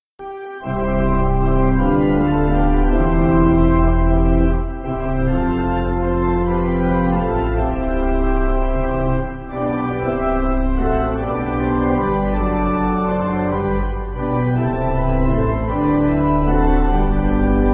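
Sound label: Pipe Organ, Music Backing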